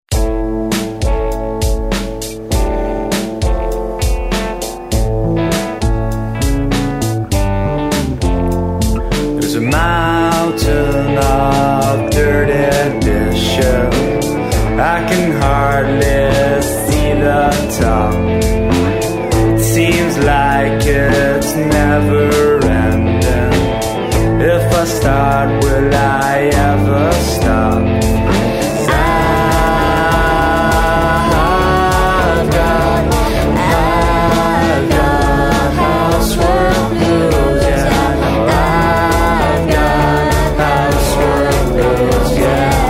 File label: Housework Blues